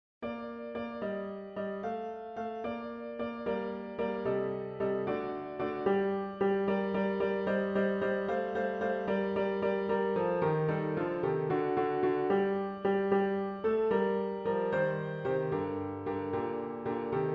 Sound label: Tarantella